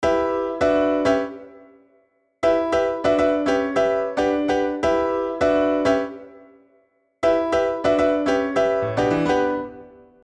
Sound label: piano harmony